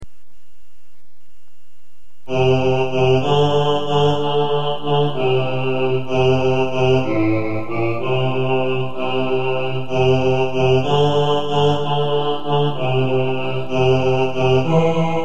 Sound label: 4-part bass, choir